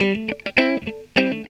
GTR, GTR RIFFS, Funky Elements; Zero-G

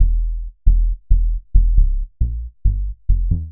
Soft Sub, 136bpm, E, bass; musicradar; bass boutique

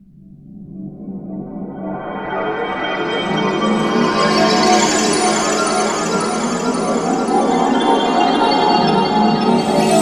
TWINK; Optical Media International; Sonic Images Library; SI FX Vol 7

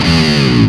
Feedback, Industrial; Nasty

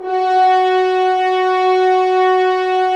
Horns; Roland - LA Composer vol. 4

